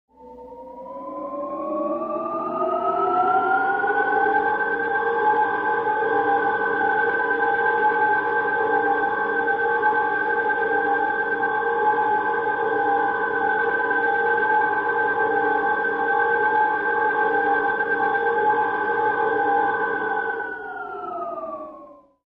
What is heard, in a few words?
Electronic signal, energy buildup; radio signals